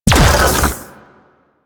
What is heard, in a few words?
Flame Laser Fire